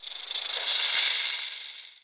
ambient

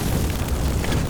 burn

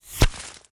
icelance collide

